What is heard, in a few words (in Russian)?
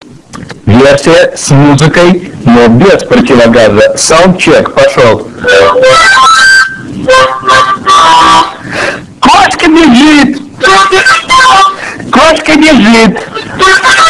pod garmoshku